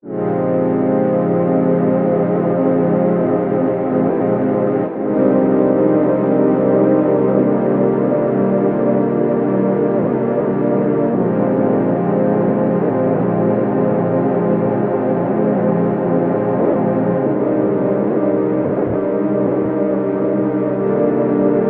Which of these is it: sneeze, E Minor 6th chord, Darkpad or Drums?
Darkpad